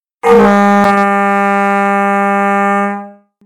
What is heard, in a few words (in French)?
son 4 trompes acier superposees